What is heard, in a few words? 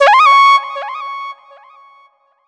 Sting Alert, phonetones; Sony Ericsson; W508; PlusFourSix; Brittany; Lars Edvall